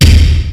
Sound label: k1, Kawai K1 Drum Machines; 300 Drum Machines